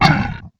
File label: Monster Hurt